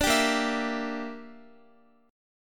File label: Bb 6th Flat 5th Bb down Guitar; Bb6b5 strummed